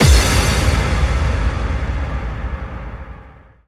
boom; osu

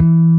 bass

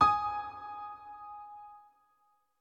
piano low

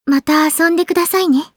applause; osu

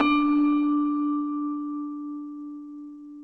Bell D4

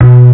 bass; osu

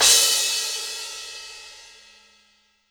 drum hit finish

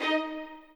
strings

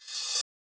Reverse Cymbals

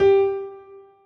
Key G5

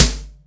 soft hit normal; osu

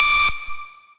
lead

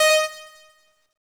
normal hitwhistle

